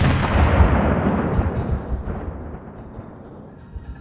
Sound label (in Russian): golos orudiy grom vozmezdiya; zvuk voennyih ucheniy